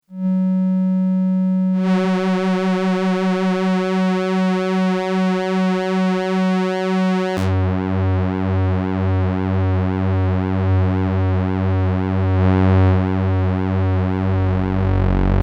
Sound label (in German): VCO1-2 sub dann oktaviert pur Wellenmischung